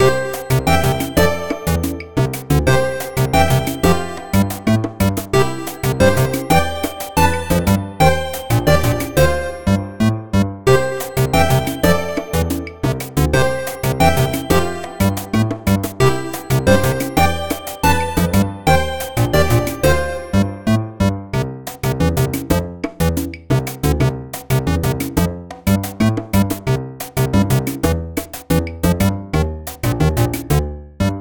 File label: Start Menu music